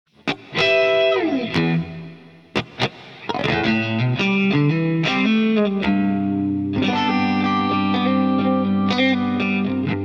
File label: ToneX One Soldano SLO 100 Clean Board; IK Multimedia